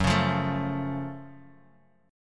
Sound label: Gb Minor Major 7th, Guitar, DADGAD; 4,4,3,2,x,x; down; chord shape